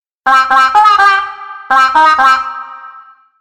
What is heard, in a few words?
wah wah sms